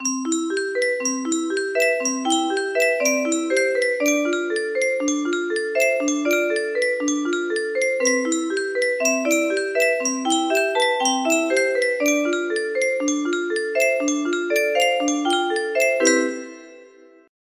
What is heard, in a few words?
music box melody